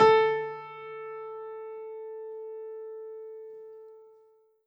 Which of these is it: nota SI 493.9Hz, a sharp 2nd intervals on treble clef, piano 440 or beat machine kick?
piano 440